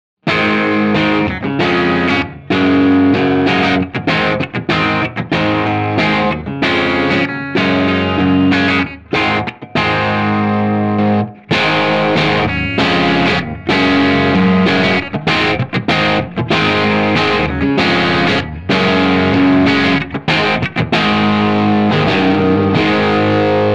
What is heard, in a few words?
Sterling Majesty X Bridge Humbucker Crunch Boost